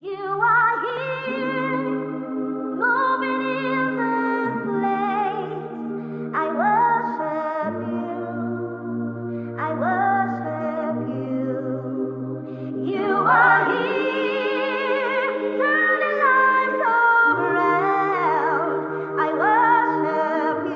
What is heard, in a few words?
Drill version; Naijaremix